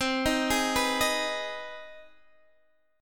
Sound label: C Minor Major 7th Sharp 5th, Guitar, DADGAD; down; chord shape